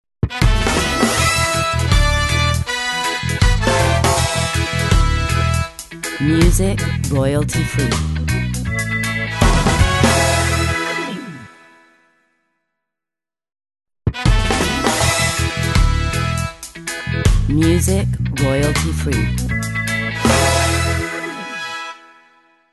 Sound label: Reggae station break